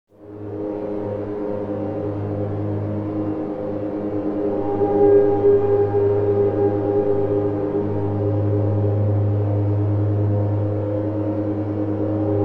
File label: gong